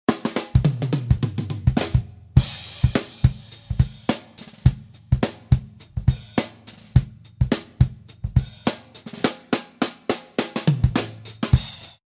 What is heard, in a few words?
Snares DRY; RaySpace